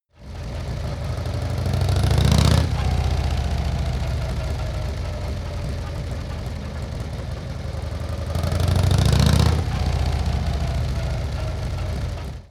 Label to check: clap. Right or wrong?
Wrong